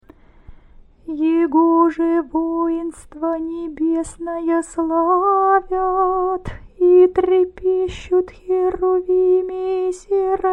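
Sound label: canon hirmos, singing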